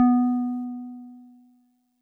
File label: DigiPianet DX100 B2